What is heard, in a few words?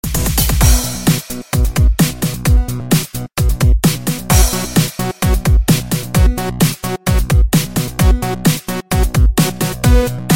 8 b